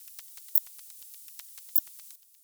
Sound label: Pipistrellus kuhlii, batcalls, europe